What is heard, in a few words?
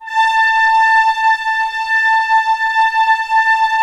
ORC A4 PP, Orchestra; Sound On Sound - Peter Siedleczek's Orchestra